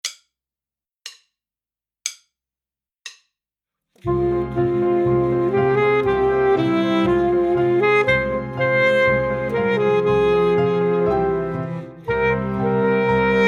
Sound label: Duetto Student Sax